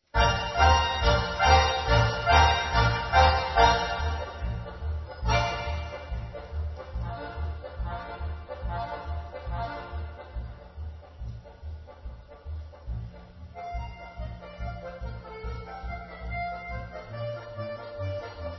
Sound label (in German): Marsch in B Ensemble